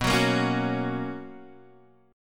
B Major 7th Suspended 2nd B down Guitar Half Step Down